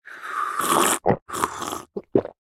Eat

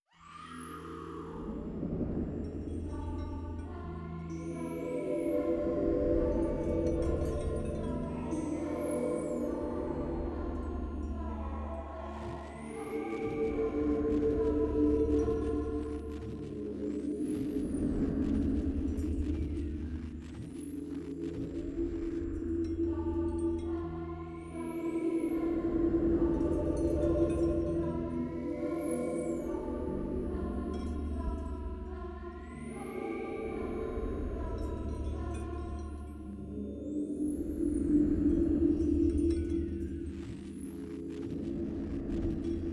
loop; Bendy: Lone Wolf

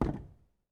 Crate Impact

